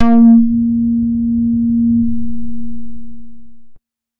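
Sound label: 90s club bass A sharp 4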